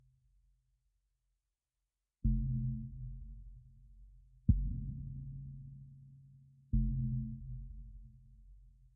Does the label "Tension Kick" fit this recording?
Yes